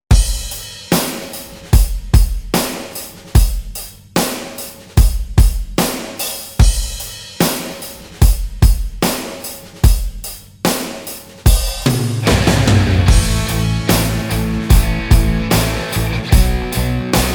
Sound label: rock